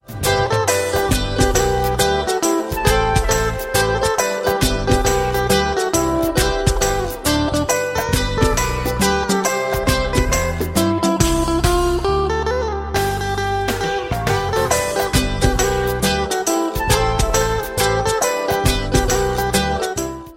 INSTRUMENTAL RINGTONE

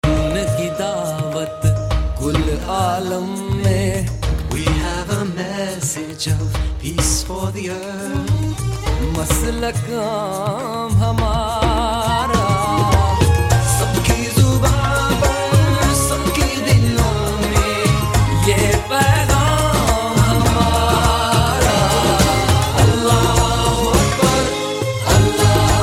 naat, ringtone

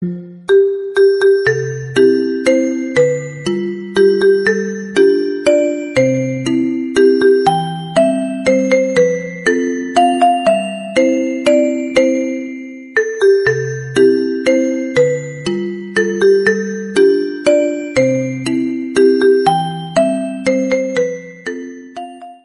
happy birthday marimba remix ringtone

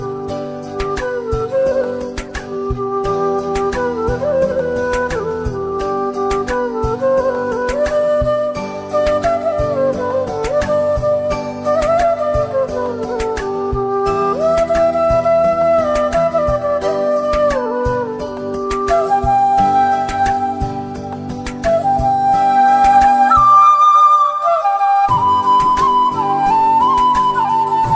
FLUTE RINGTONE

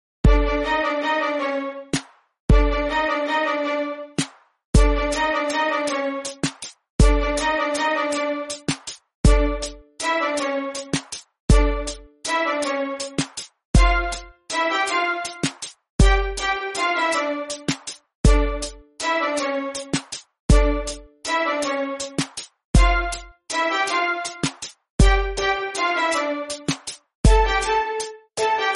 BGM MIX RINGTONE; VIKRAM VEDHA

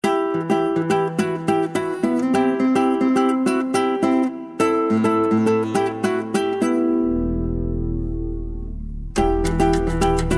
SPANISH GUITAR RINGTONE

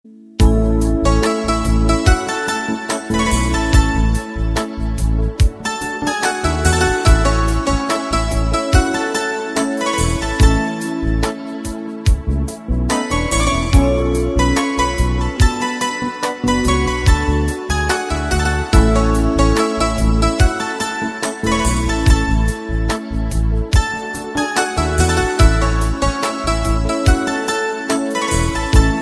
instrumental ringtone

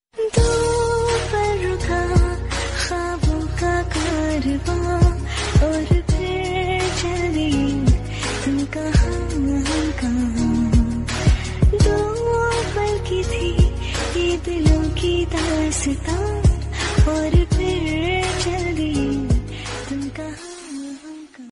FEMALE VERSION RINGTONE

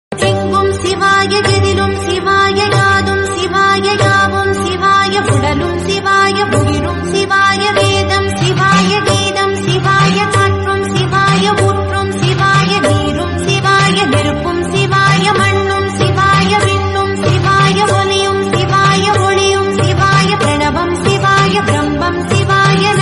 TAMIL GOD SONG RINGTONE

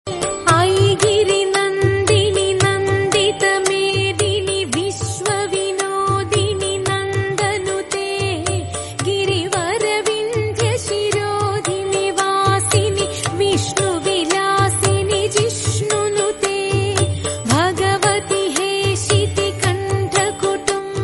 TAMIL DURGA DEVI SONG RINGTONE

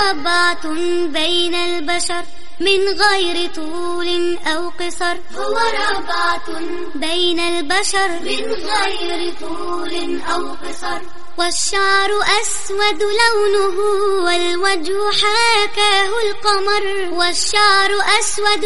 Islamic Alarm Tone

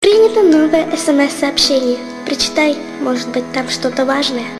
SMS RINGTONE

